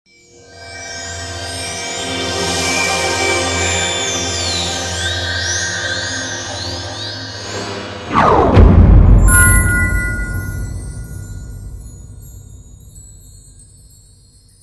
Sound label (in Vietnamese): Âm thanh nhấp nháy ánh sáng kim cương kho báu